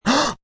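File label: Gasp Short male, Gasp, male, short; Gasping; Voice; (human voice)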